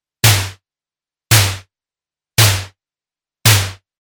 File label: Snare Arp VCO Sys 500 Filter; electronic music